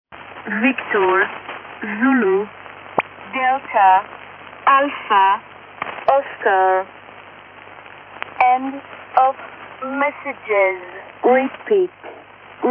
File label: 7690 kHz